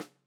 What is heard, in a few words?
Rim